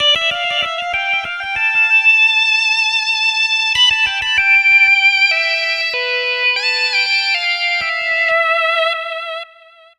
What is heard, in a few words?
80s Lead; Riffs & Solos; Dr. Fink's Funk Factory